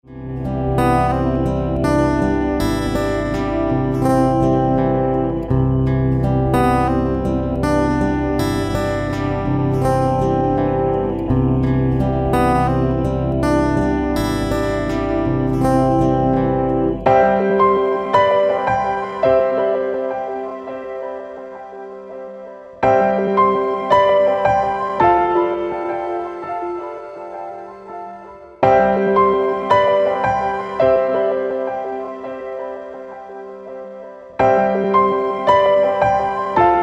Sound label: Instrumental